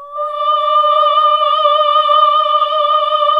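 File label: OHH D#3, choir; Best Service Gigapack 1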